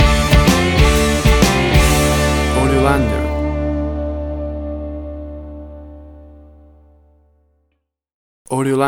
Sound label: Bluestown Boogie Stinger